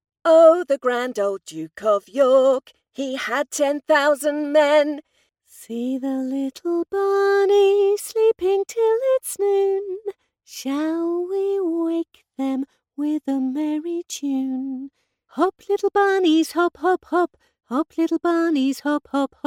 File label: singer british cantante inglesa ninos